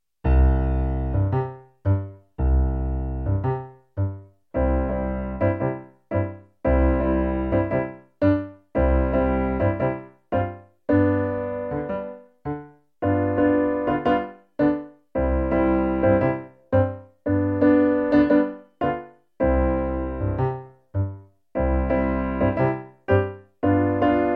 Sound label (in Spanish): Habanera PIANO; musica II ESO 2015